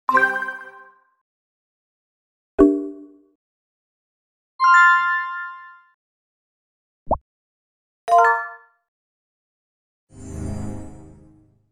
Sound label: game sounds